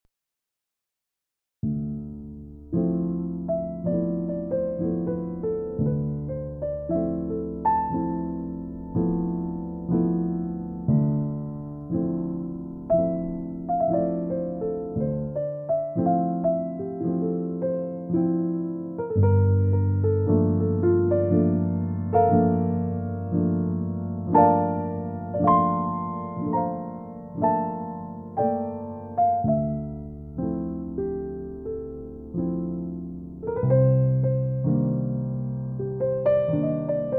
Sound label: Jazz Piano